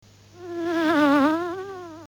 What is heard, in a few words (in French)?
Mouches